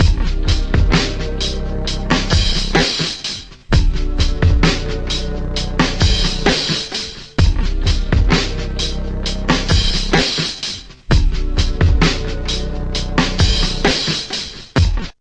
atmospheric two toner, drum loops and breaks, 065 bpm; 000 to 080 bpm